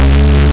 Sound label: Beefy Fast Gun; A-10 Attack! DEMO